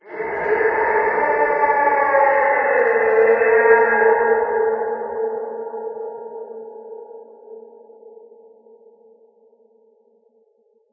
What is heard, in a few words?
Forest Scream; Voices of the Void